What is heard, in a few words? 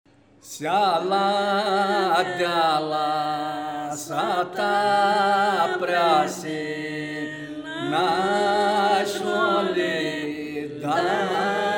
Sesklo lament male Vlachs